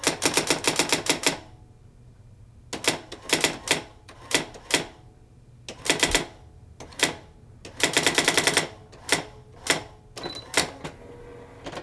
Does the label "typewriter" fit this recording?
Yes